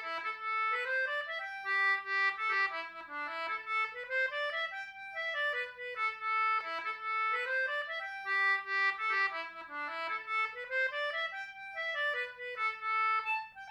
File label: Polka, Celtic Button Box; Bally Desmond I